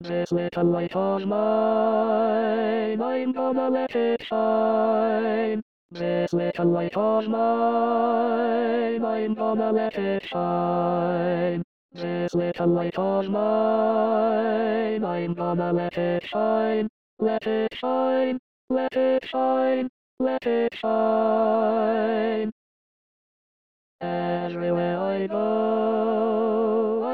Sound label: Hommes; This little light of mine